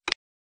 close; Recode; jewel Quest Riches

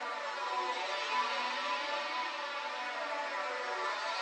Reel Spin Loop; Mahi Gaming

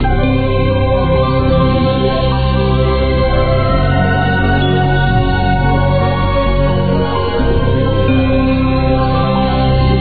Music Loop; crystal Gems; Core Gaming

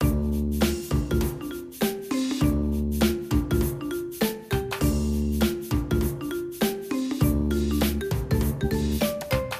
bonus music; Skillzgaming; fruit Blast Desktop

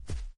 spin; riviera Star; Fantasma

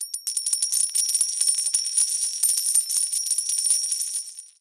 coin fountain; Skillzgaming; battle Mania Desktop

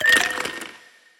respin block break slow; medallion Desktop; Fantasma

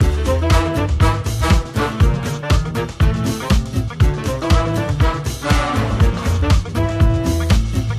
Wheel Music Loop; Diamond Empire

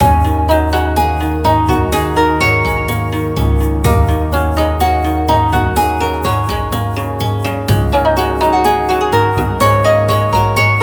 bonus level; Recode; jewel Quest Riches

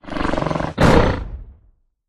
bonus collect all; allirog; Recode; rampage riches Desktop

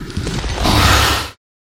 collect all; Recode; rampage riches

